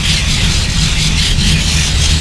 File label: laser cutting; weapons; galak